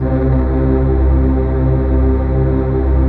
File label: RAVE PAD; Best Service; XX Large; Pads